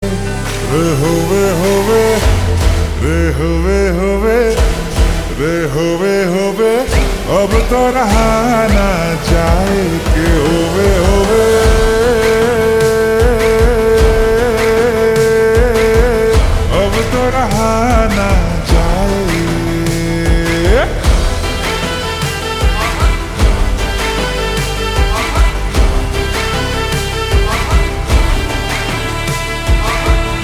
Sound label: Ringtone Slowed Reverb